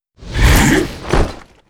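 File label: priest spawn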